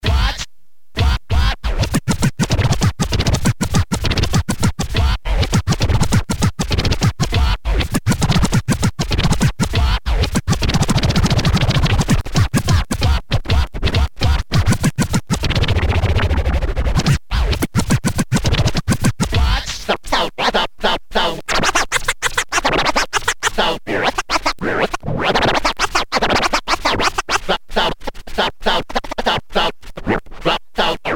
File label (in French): Bruitage Scratch